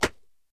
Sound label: clap; smite; cerberus